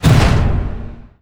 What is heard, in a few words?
menu quit, ui